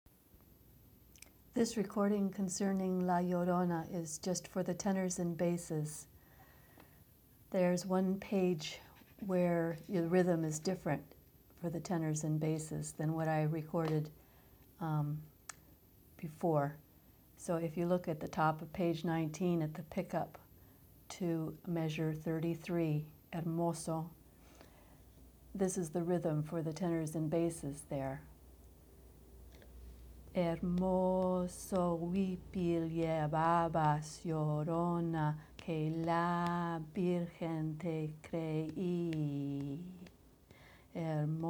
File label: la llorona rhythm reading note